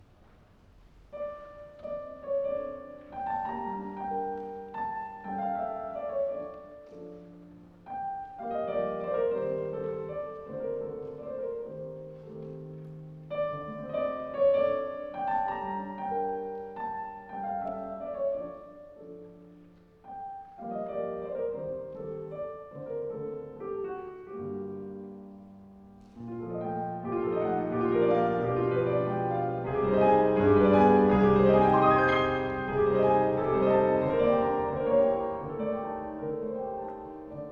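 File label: Mazurka, piano; Santiago de Compostela; SCRIABIN; Op.3 No.3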